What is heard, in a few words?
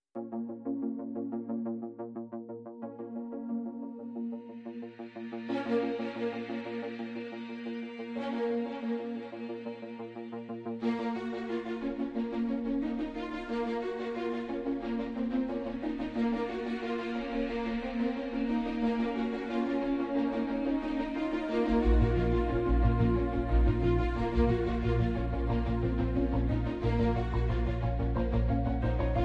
No Perc